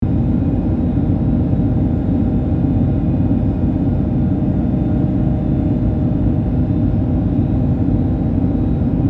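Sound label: Ambience Turret Room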